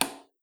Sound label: switch-off; SupaLidlGame